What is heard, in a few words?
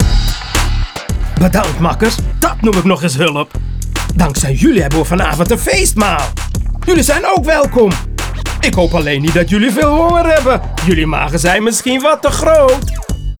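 Outro Victory Dutch; arthur and the revenge of maltazard; wii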